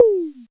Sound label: Tom Casio SA-1